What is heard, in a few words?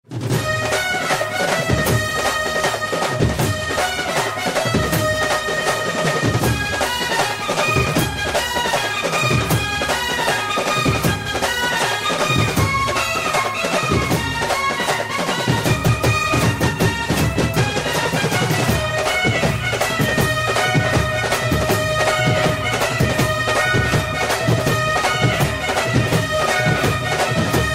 mass bgm ringtone; Fight Club